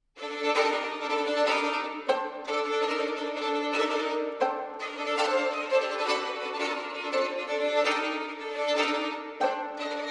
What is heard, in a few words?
English Violin Duos; Eight Duos No2